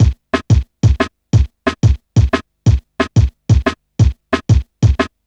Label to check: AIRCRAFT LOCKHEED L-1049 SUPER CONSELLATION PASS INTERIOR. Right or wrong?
Wrong